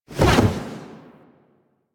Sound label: Wall Latch End; Warframe; Tenno